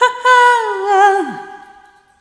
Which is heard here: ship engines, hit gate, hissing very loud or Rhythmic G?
Rhythmic G